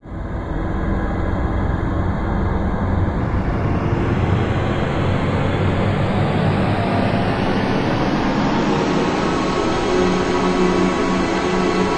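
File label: Low Amb Tones Heavy, ascending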